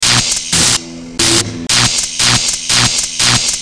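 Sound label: zvuki toka, electric current sounds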